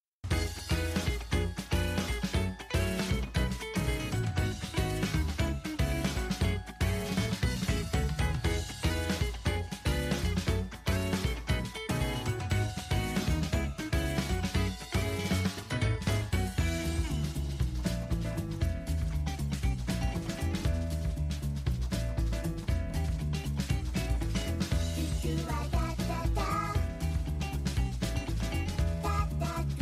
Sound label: March